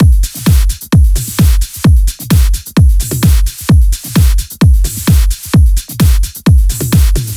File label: 130BPM Organity Kit; Vengeance Samples